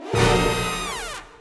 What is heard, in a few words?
Combo Stab; Bee Movie Game